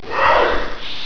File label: jump; quake2; wraith2